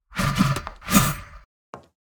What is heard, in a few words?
npc strike